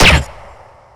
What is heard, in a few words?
silenced special shoot